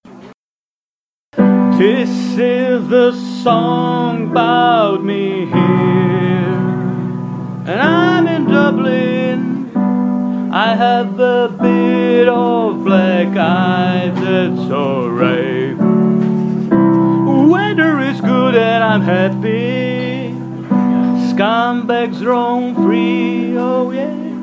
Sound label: piano